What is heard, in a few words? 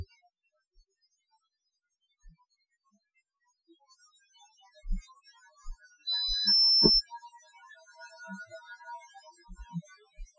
Noise Filtered